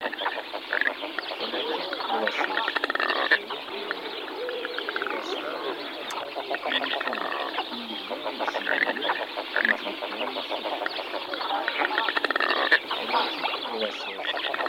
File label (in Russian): Луток Pesciaiola